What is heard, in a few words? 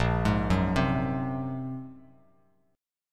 Bb 7th Suspended 2nd Sharp 5th Bb, Bass Standard; Bb7sus2#5; arpeggiated; 6,11,4,5; JGuitar